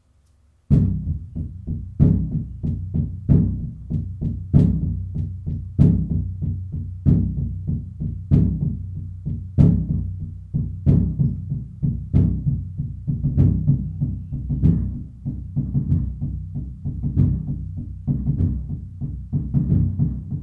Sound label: Hall Drum Played With Medium Mallets